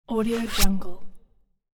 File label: foley zipper sharp fast; domestic sounds